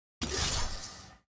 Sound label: tier wings promotion; ranked; silver